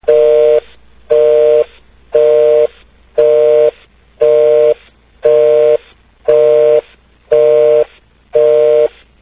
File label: busy phone